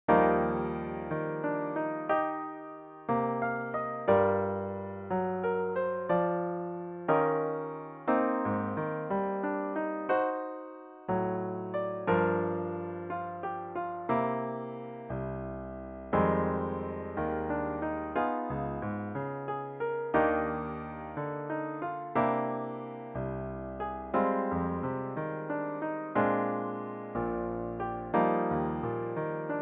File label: solo piano